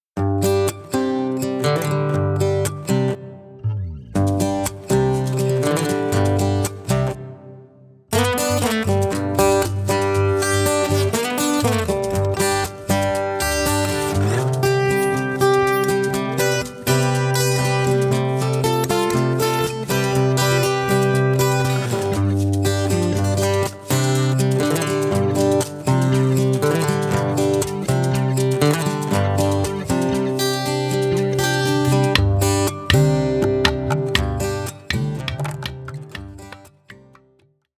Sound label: Rooftop music